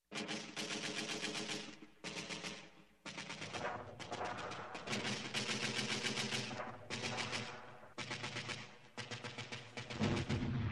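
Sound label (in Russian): Перестрелка вдали взрывы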